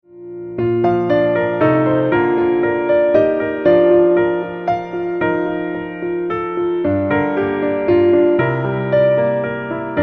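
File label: Sweet Hour Of Prayer, Piano Low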